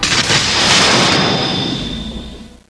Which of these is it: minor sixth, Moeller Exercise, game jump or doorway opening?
doorway opening